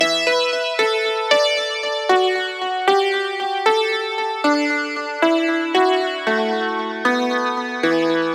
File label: E Phrase Digi Piano Run; Zero-G; Future Wave